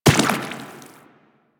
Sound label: Step Water, Footsteps; Enemies; Warframe; Teshin; DE